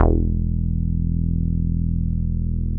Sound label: Bass Long E; Vengeance Samples; Long Basses